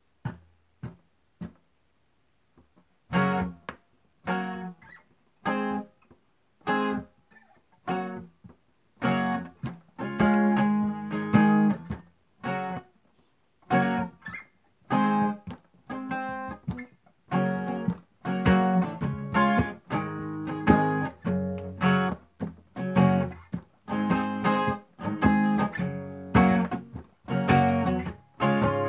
acoustic; island flavorz

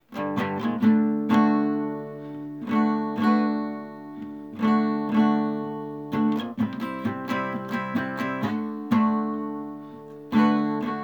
music acoustic; fuji sushi

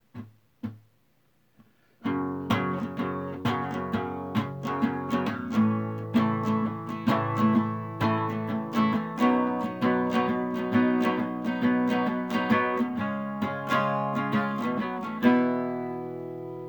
acoustic; j and j flooring

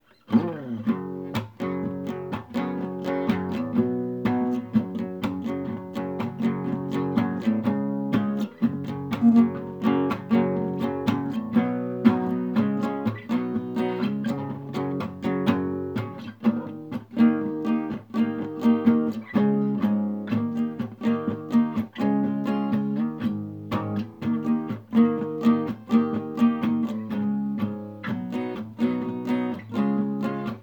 acoustic; J and E's Pawn Shop

